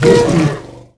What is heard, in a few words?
hit, creatures; Star Wars Obi-Wan; XBOX